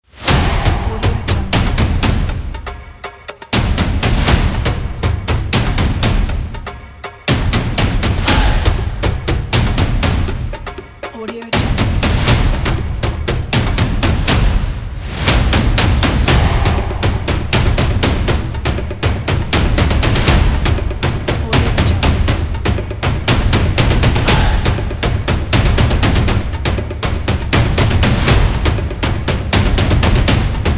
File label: drums; alivetunes